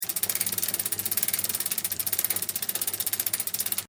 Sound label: Bike Chain Spin Moderate Loop; LYN103 package of Chain Sounds; Bicycle Sound Effects; LynneMusic